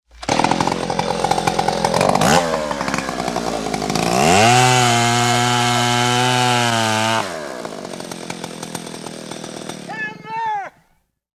industrial tools chainsaw cutting tree voice shouts timber; sound effects one